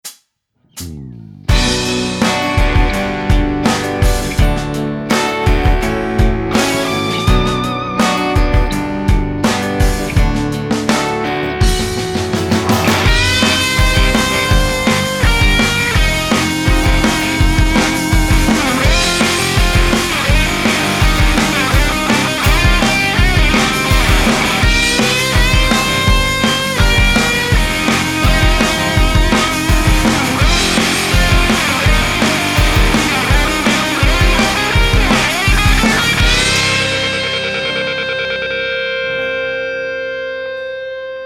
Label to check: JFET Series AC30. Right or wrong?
Right